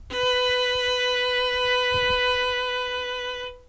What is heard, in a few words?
violin